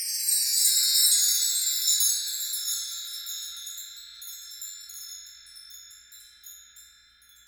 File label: Wind Chimes Orchestral; Electa Quinney